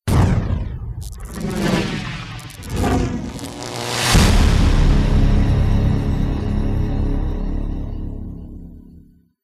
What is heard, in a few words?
Logo (Sony PlayStation Version); Midway